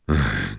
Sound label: FISHING FAIL